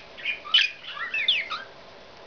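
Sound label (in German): perlender gesang, wellensittich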